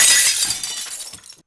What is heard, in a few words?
glass small